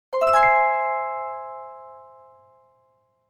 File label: scan complete